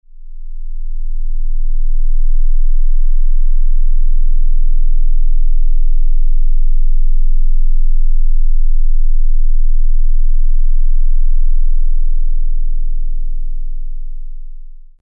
20 Hz Sine Wave Low Frequency

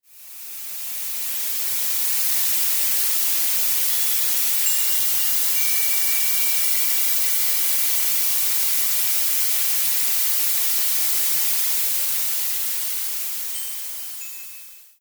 Blue Noise and Wind Chimes

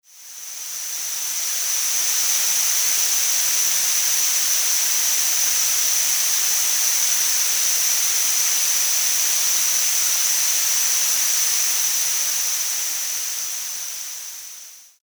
Tinnitus 6 kHz Noise Masking

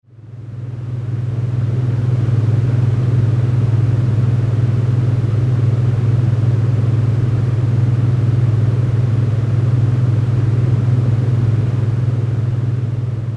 20 hz beta wave binaural beats; binaural beats 20hz with noise

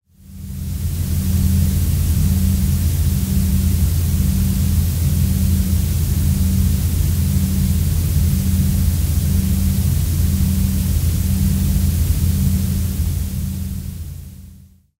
moon noise; ambient

